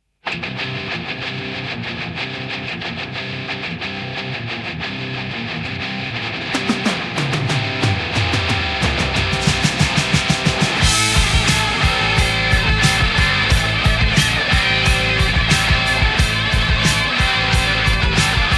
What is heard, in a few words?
instrumentalnaja kompozicija; ethereal frenzy ext